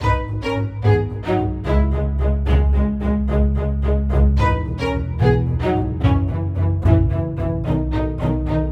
String Loop - 110 BPM G# Min; Instruments; String Loops; Cymatics; EDM Starter Pack

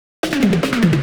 Fill, 150 BPM; Cymatics; Light Free Sample Pack; Light Samples

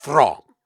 Grunts and Chants, Viking Vocal

